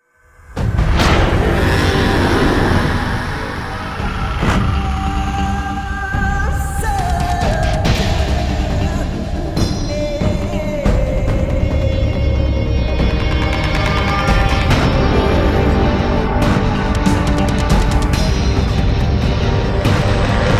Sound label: choose team, music; Counter-Strike Global Offensive